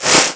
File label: attack